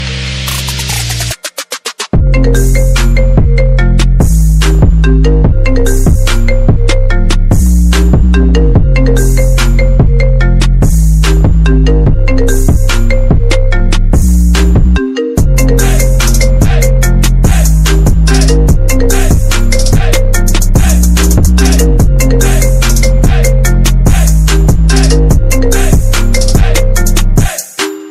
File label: iphone ringtone trap remix